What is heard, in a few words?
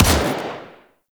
Burst Rifle Fire; Warframe; Grineer; 3D AI